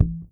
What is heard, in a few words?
fret dead, Pedulla bass; Masterbits CLIMAX 7 - Bass